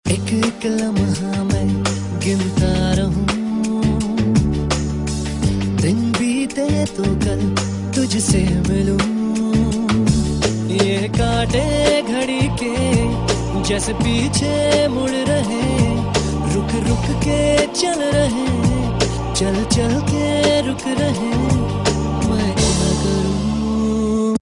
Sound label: Male